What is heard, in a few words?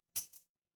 glass shatter